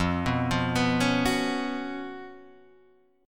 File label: Db 11th F, guitar